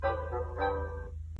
left button; roku; romance

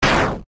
attack hit sound effect; undertale

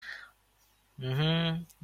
uhhuhhh

